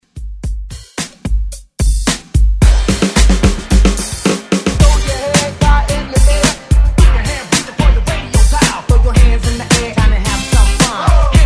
80s reworx

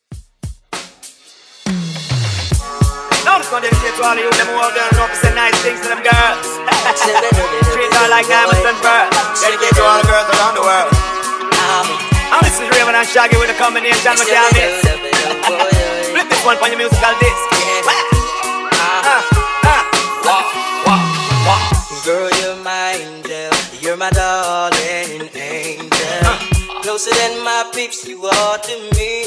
Oldschool 90s Drum Break Chorus, 100 BPM

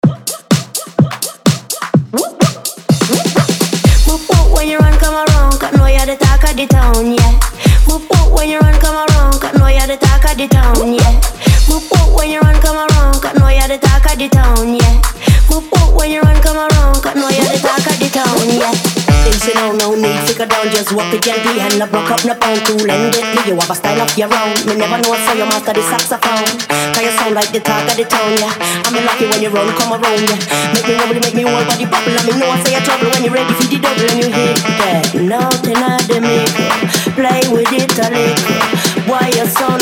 BassHouse

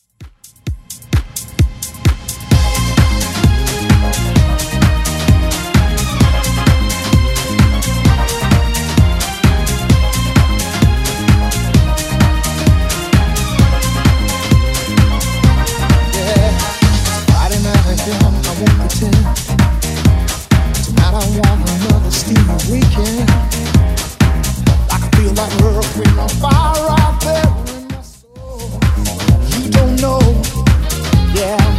2Ks Redrum 130bpm

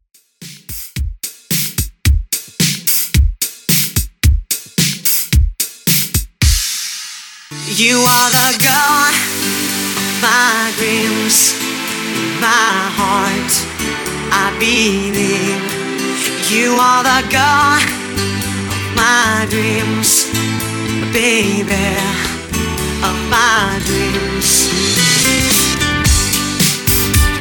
Classic Redrum 110 Bpm Clean; djyan

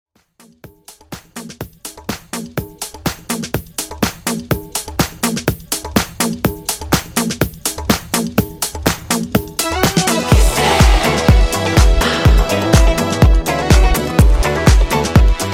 ReDrum, 124 Bpm

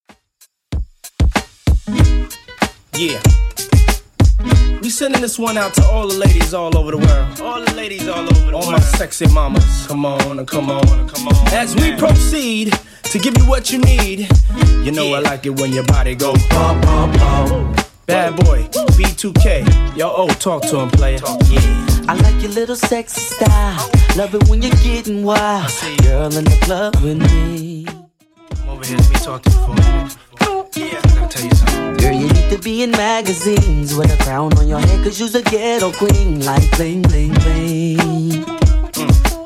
Rnb DJ Friendly Redrum 95bpm CLEAN

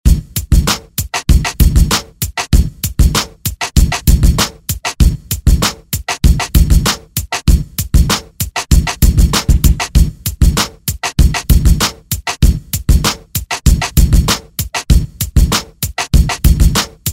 Reggae Ext Redrum

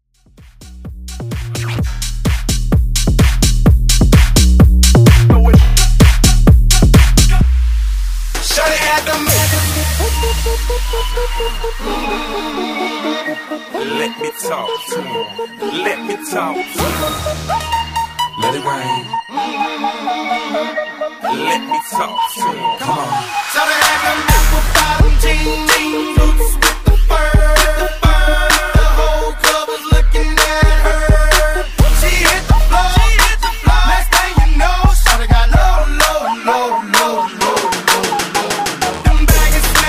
Funk House Bootleg Mashup

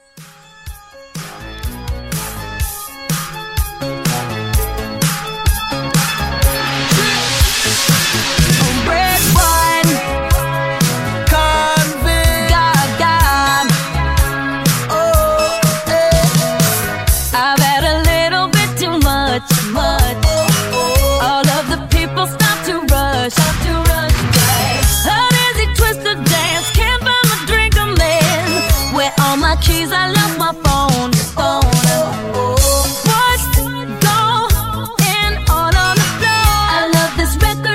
Halloween Mashup 124

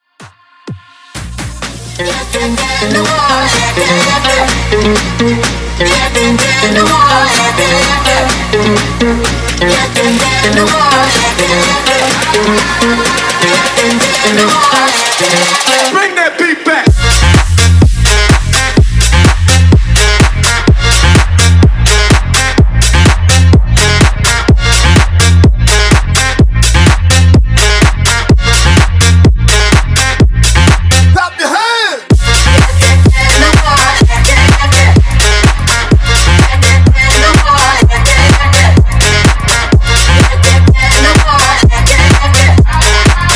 Club Dance 126 BPM; Club Dance Edit

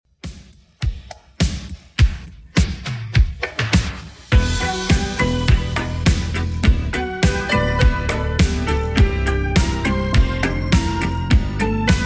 80s Redrum 103bpm

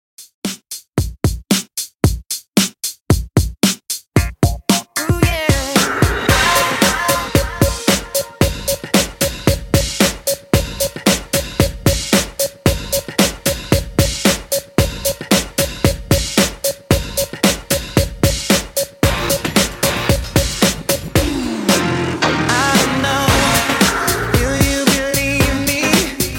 Redrum 113 Bpm Clean

